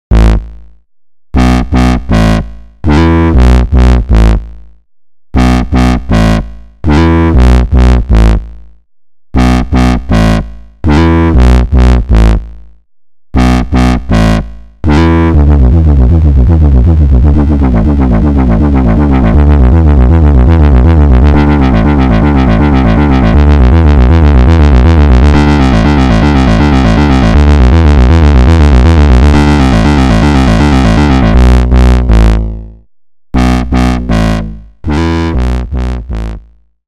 Wave Table OSC Opto FET

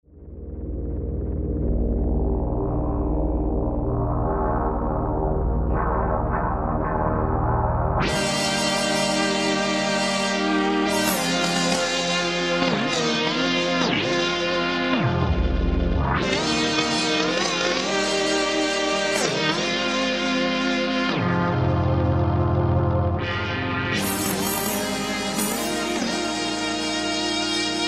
vox mouth

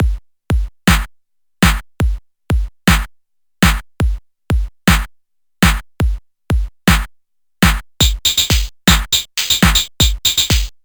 Soulsby Oscidrum Drum Set

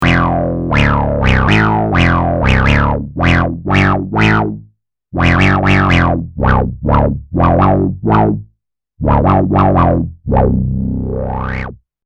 microbrute Whouop Sub

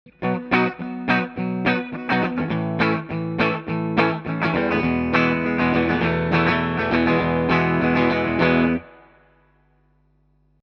GA 47 Cardioid EGuitar Clean Chords

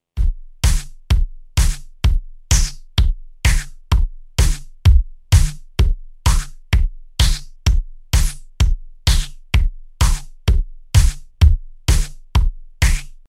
Phase

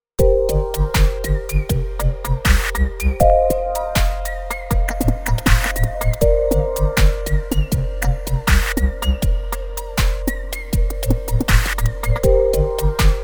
Zoom AR-48; Alternative

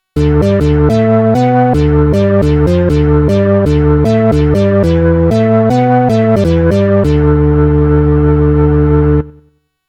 Modal Craft Synth 2.0

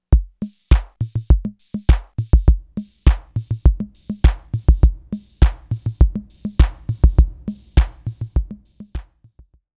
Room; Behringer Space FX; Korg Volca Beats

